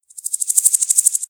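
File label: Canyon Random Critter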